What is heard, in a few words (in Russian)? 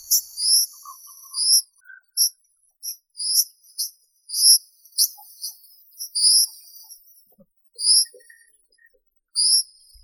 oliapka buraia